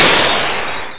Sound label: Player kills spark; Prometheus 1.0